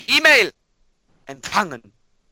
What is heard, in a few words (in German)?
email empfangen